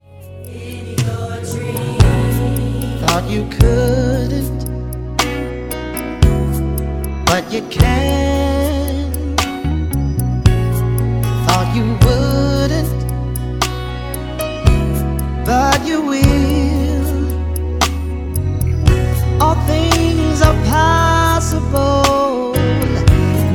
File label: Verse 1 in B, choir practices